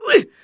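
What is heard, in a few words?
human middle male damage, voice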